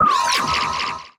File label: troll